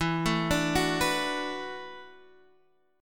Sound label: B Minor 7th E, chord; Bm7/E; arpeggiated; JGuitar